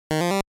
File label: hit